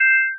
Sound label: chime; nature-ambient